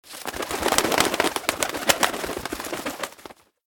oiseau

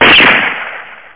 pistolet; CyberBlast

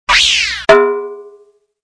bascule

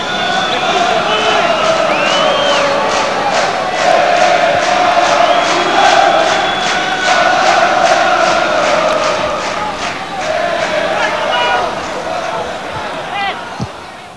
Foule chantante; L'Effet Pommier - Volume 04; Sports

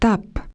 tapes; Mon Premier Dictionnaire Super Genial Nathan!!!

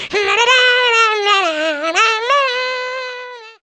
lutin; Mobiclic; Jeunoel

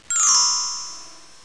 dling

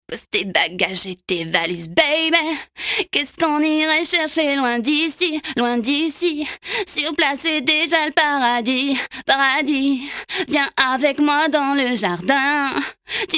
Bruit

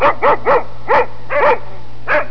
Chien, Animaux; Chien 3

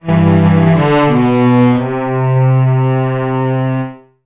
basse

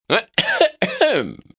tousse; MOBICLIC 65

